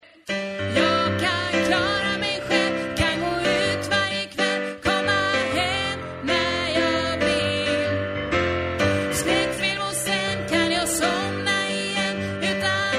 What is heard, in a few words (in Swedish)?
alt, stammor